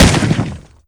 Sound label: Automatic AGS-17 End, Weapons; DCS